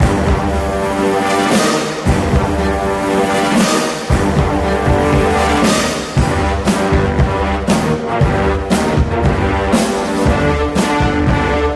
boss battle iphone 4s ringtone

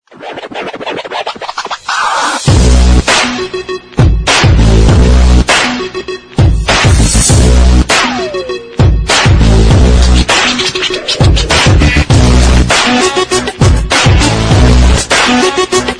check your message ringtone; iphone 8 plus

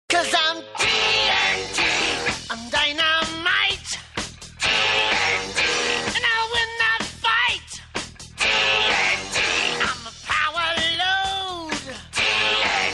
rock ringtone; iphone 3g s

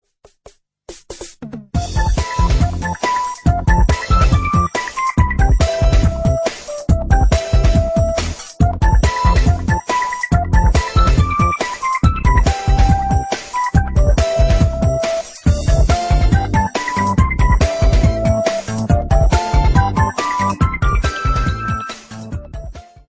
remix ringtone; i phone xs max; zelda ocarina of time

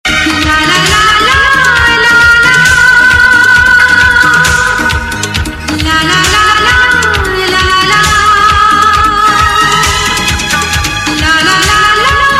instrumental ringtone

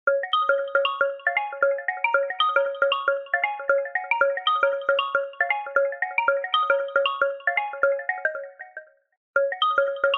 iphone original reflection ringtone

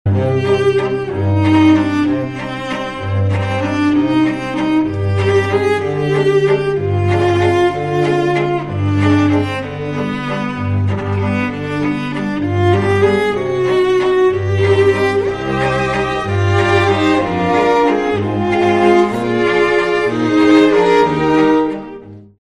i phone 6 s plus romantic dance ringtone

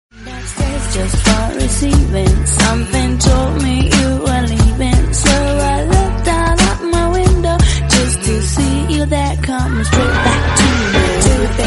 french kissing ringtone; iphone 8 plus

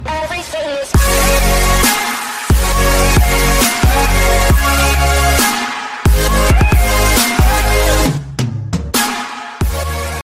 ringtone; i phone x plus

